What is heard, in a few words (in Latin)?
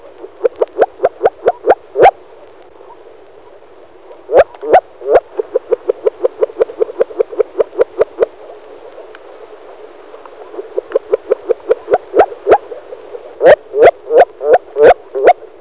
rana arvalis